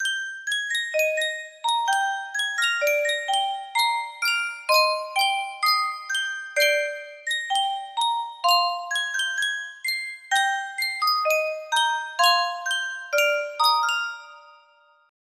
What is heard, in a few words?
Sankyo music box